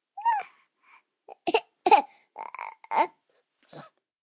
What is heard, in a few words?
Baby Soft Fuss, Baby fussing, 1 yr., short, quiet, human voice, kid, child, infant; Talking Sound Effects; Sound Effects:Voice; VoiceBox